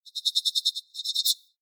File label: Future Telemetry Computer Interface Digital Robot High Pitched Long; Tom Hutchings